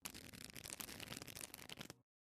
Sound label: cards; DD21Blitz